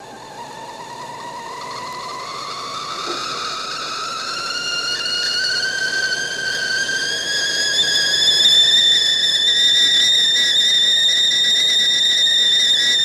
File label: Tea Kettle On, Loop